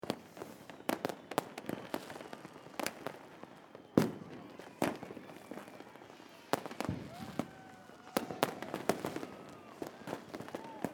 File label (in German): Silvester Atmosphaere